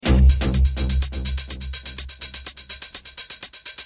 sms

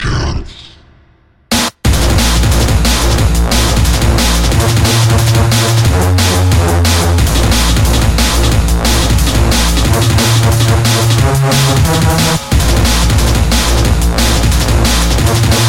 drum and bass tone

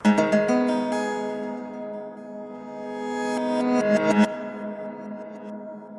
e minor chord strum

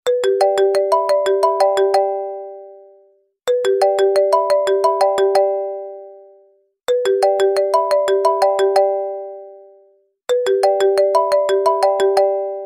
message; vertu

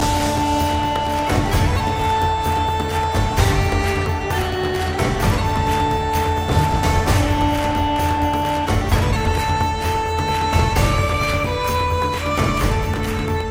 irish medieval

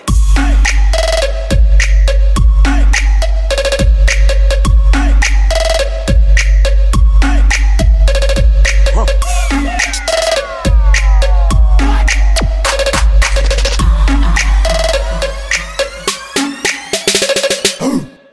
trap

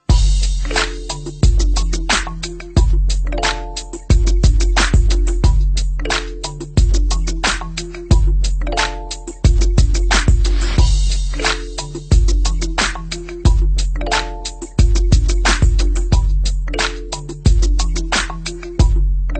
instr

